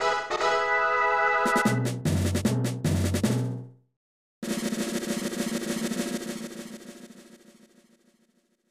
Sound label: Minigame Win; Alice's Paint Adventure; SNES